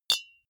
deodorant hit, metal; soundpool

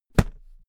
briefcase close slam; industrie wirtschaft; bueroarbeit

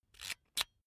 electric drill template slide in slow; soundpool

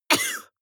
cough male single short; soundpool

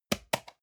storage fall table soft, box; objekte

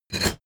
mechanical switch stone small push; industrie-wirtschaft; mechanik

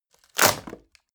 wood break short; foerster

